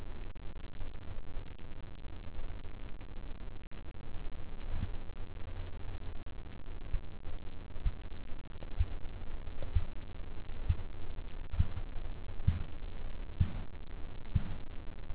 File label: 8bit Stereo